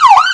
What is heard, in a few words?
american ambulance siren; gmod; svmod